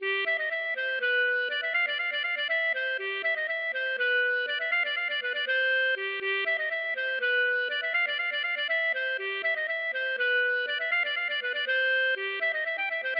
bourree; Des Jeunes

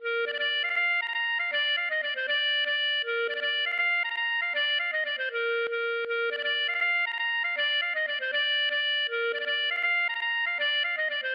gavotte; Gavotte pourlet No7

